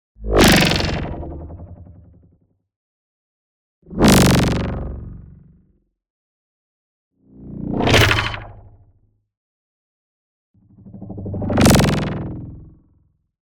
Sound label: WHOOSH LARGE FAST Filter Growl; boom library; Neon